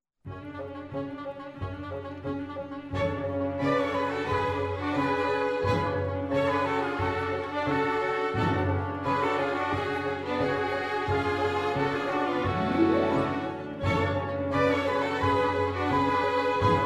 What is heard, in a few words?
Turkish Dance; Fourth Way